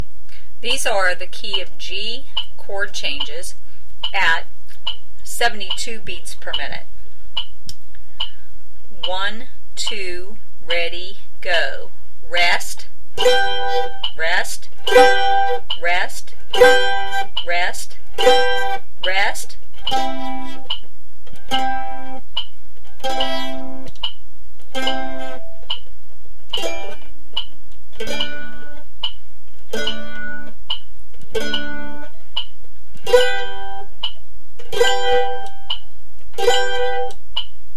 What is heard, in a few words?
G Chord Changes 72bpm, mandolin